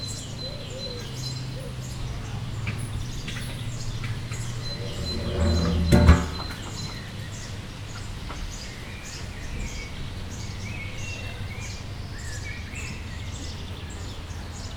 nyílik a leopárdház rácsos ajtaja; jaszberenyi zoo 2015

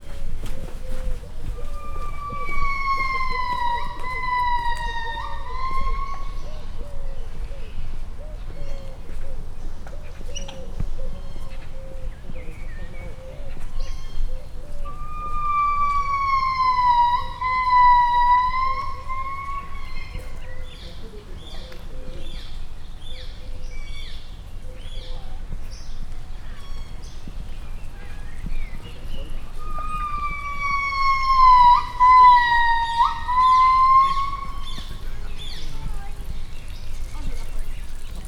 így szokott lenni mire odaérünk, bóbitás gibbon; gyori zoo 2014

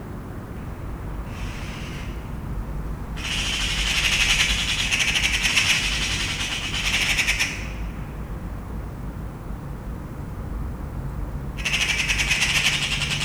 városban csörögnek, szarka; termeszetben; allathangok

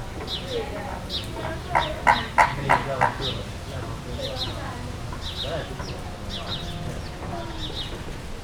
egyszer megszólal háttérben szél, rózsás flamingó; Győri Zoo 2014

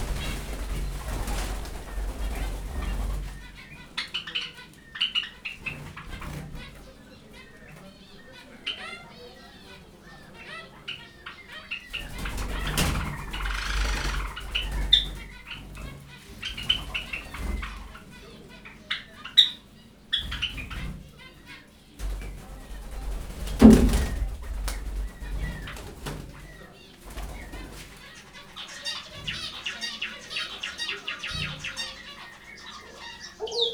pintyek, repked egy galamb is, zebrapinty; jaszberenyi zoo 2015

